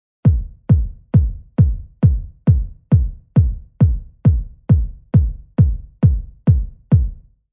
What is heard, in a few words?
kick after; pro q3